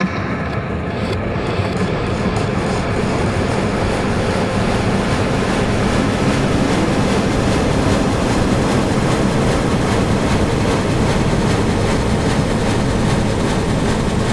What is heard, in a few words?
Cinematic Metal Impacts; boom library